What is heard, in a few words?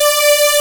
BIG SAW D4, Analog, Synth; E-MU Formula 4000 Series Vol. 3; Analog Odyssey; Synth Builder